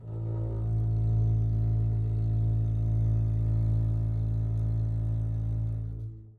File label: a1 down, bass arco; meatbass